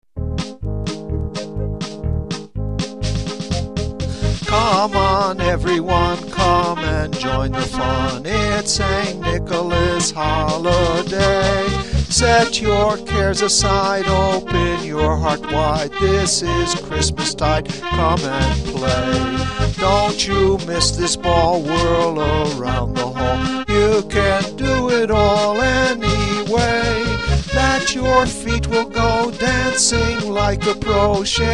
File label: polka